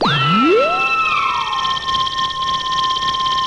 basic beam charge, weapons

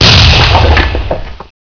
asteroid hit

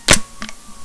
uzi ins clip, weapons

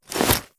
ak47 cloth fast

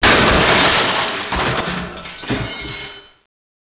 metal break

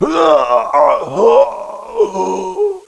death; caleb

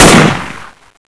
M4 shoot, weapons

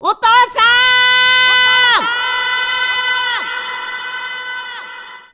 shield

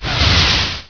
vanish

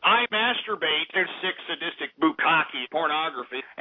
gar alert; garg

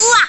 pain

